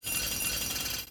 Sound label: idle loop aggressive; Barotrauma; Barotraumatic; Clowns; ClownSawcrazy